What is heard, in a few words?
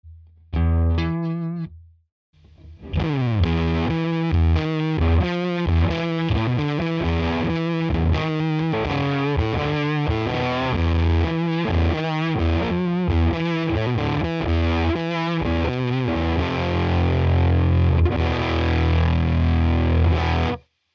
Fuzz; Arbitrator